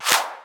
Shifted Clap; FLPRO

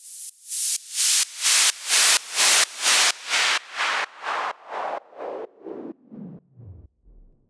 Fall Side Chained

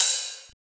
Ride; FLPRO

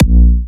Hitter A#4, Kicks, Big Room Kicks

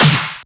SnareClap; FLPRO

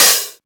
Open Hat; FLPRO

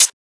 Closed Hat; FLPRO

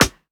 Percussion; FLPRO

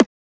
Transient; FLPRO

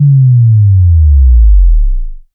Sub Drop Basic - Clean

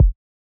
Kick

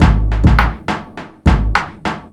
Loop

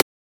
Transient; FLPRO

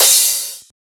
Crash

